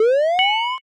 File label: cast start